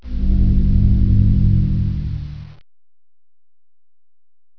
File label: pipes